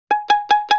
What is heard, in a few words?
Pizzicato Loop; Vintage Cartoon; Musical Elements